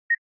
Camera timer; Samsung Galaxy Note II

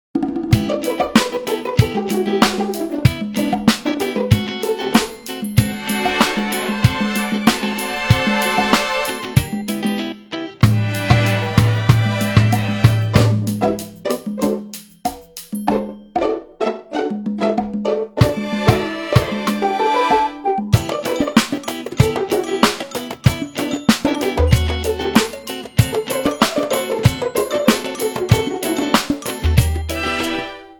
Slapstick; Nokia C6-01

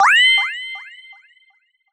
Pinged Alert; Sony Ericsson; W508; PlusFourSix; Brittany; Lars Edvall

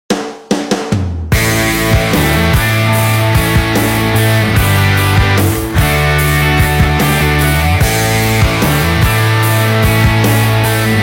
Stadium, phonetones Nokia 6300i

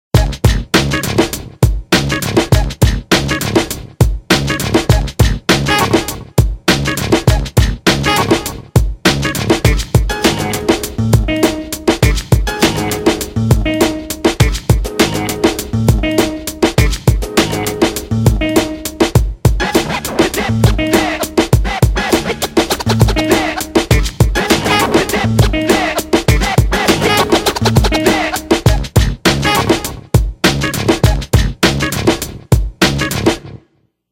Riff a Ring, Nokia Tones; Windows Phone apps

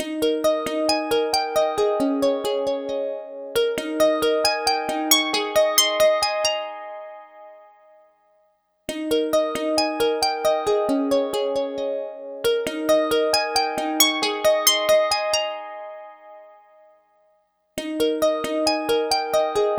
ringtones; LG; Smart Folder X100S; Snowflakes

